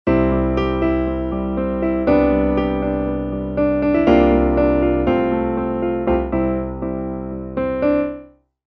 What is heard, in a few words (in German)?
Original Ballade